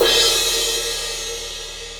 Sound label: 18 inch heavy full crash, cymbal; reflector; Paiste